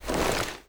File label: foley gear rattle